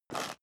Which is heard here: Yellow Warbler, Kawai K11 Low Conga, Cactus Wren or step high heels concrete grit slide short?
step high heels concrete grit slide short